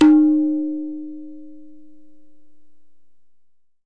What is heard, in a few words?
thap, Tabla; Small; ETHNIC and WORLD PERCUSSION